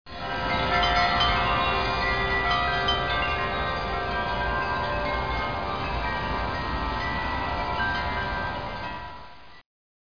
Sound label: chimes; Broderbund Print Shop Multimedia Organizer Deluxe Edition